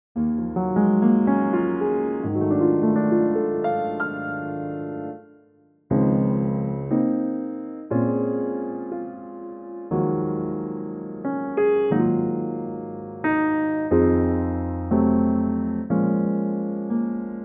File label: piano